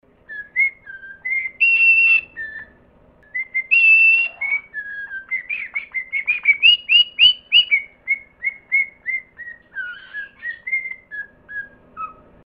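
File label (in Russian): свист попугая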